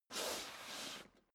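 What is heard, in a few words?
step boots metal dry slide long, footsteps; schritte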